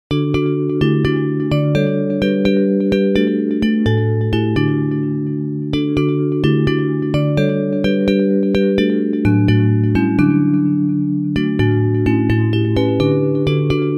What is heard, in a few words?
Bells; Hymns